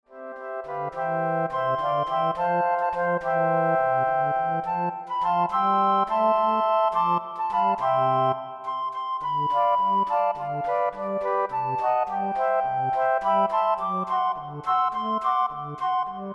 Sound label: Flute Ensemble